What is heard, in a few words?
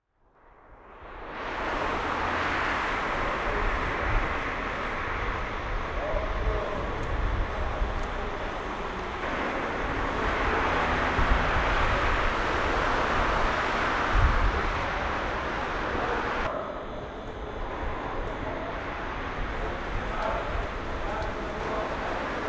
air and land